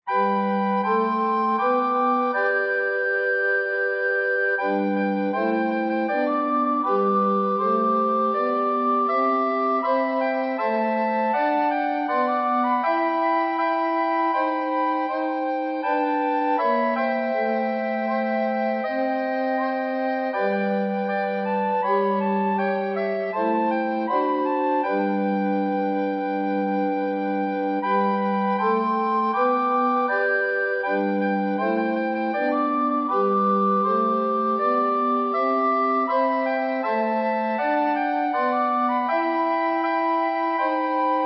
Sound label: SATB Recorder Quartet